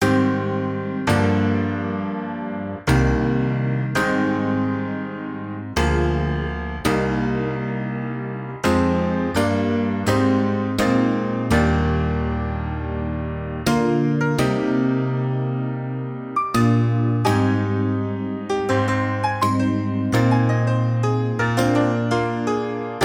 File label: PIANOS; multitracks